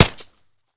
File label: fire sup, glock; Urban-Coalition; Decay; arccw ud